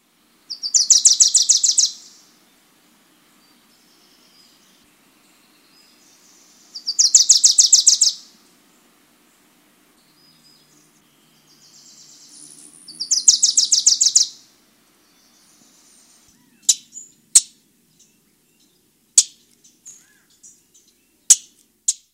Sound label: Bird Songs Wilson Warbler